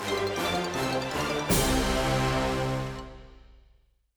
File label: Jingle Clear; Puzzle Bobble Everybubble